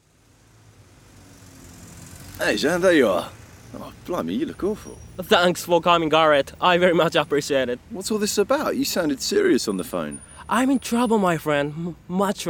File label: Whispers